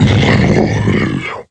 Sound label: Roar; KF Christmas; Jack Frost